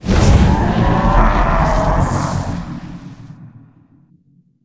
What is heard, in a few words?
lich dead, monster; rigel